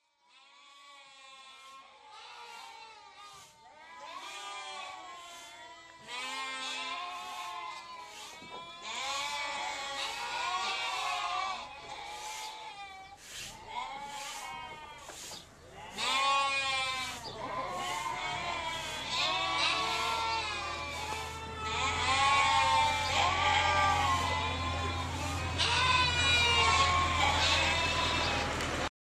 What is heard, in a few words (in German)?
Chor